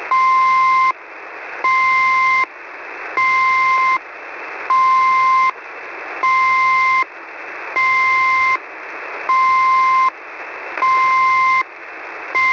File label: Marker, 4182.00 usb, numbers stations